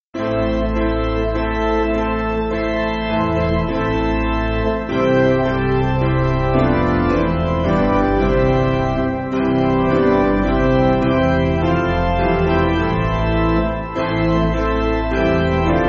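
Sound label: Piano, Music Backing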